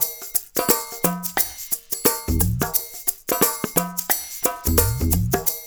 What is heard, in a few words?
loop, percussion; InVision Interactive; Percussion Slam; KWICKIE